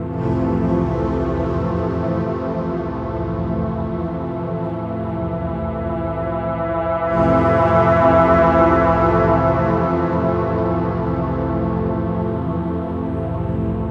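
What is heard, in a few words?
Bpm138 Pad Loop F major, Dubstep Chord Loops; Observer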